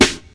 rich top end acoustic snare f sharp key

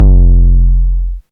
big kickdrum sample f key; bass drum single shot tuned to the F note

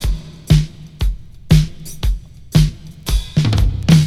118 bpm breakbeat f sharp key; drum loop

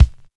hot kickdrum b key; kick drum samples

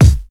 smooth kick drum single shot c sharp key

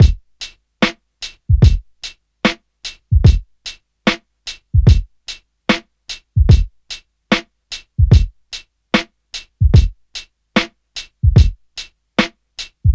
74 bpm breakbeat c key

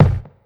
kick one shot A key; kick drum samples; single hits one shots

